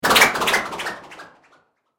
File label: Cartoon Magic Spell